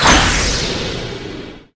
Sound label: Spike Beam; Pangya; Fantasy Golf